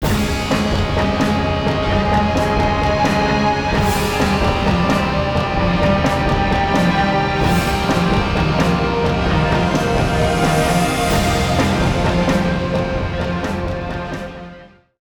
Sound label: Combat; sid meier s civilization revolution 2 2015 vita